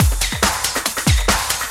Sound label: Loops BB 140, BreakBeats; Vengeance Samples